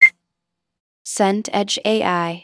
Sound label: whistle sharp call